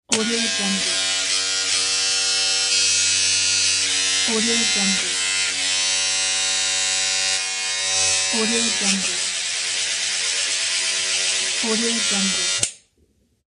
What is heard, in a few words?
electric razor